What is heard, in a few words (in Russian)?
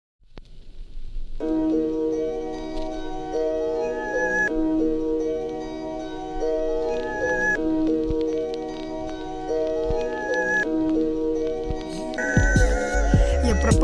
Мрачное соло